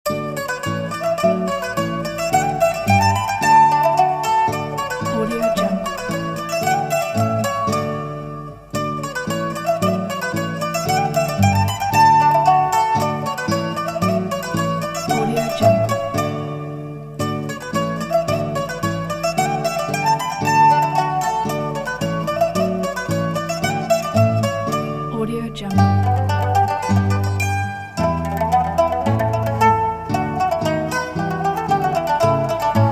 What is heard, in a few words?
mandolin melody; sound-ideas